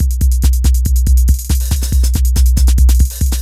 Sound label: techno 140